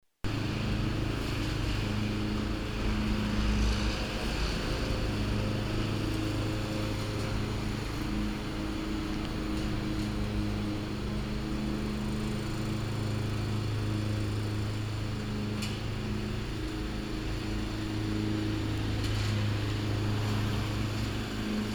Rasenmaehen; Lissagasse

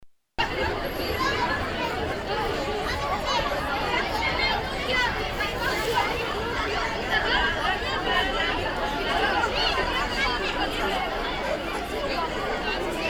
Ansammlung Kinder Linz Volksgarten

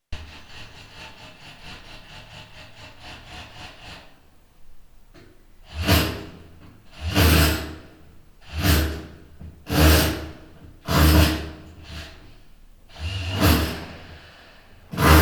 Aufstemmen einer Mauer

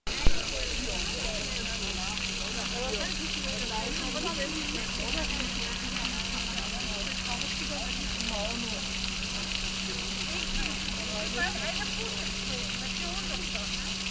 Enns

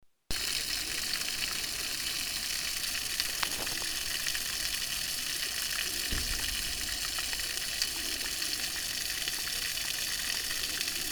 Brunnen im Schillerpark

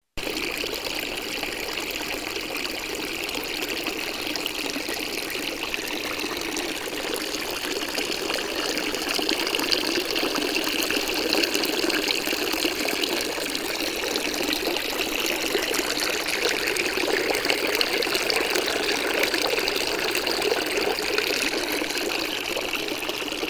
Trinkbrunnen; Minoritenplatz

